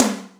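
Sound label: Rock tom, Boss DR660, ht; drum machine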